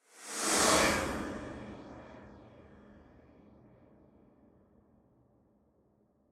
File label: Horror Creepy Resonance Swoosh